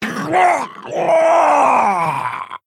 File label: Male Orc Death